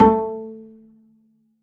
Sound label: pizz a3, double bass